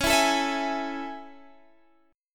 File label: A Major 7th C sharp